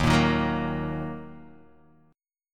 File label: D sharp Minor D sharp, Guitar Open D; 1,1,1,0,1,x; chordshape